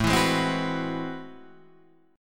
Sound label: A Minor Major 13th, down, Guitar, Open D